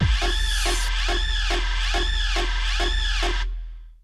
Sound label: Essential beats; FM 57 - The Ultimate Sample Collection (1997); Repedative Nature(142), Psycho(140), Crowded(140)